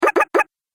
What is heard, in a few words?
Jump Video Game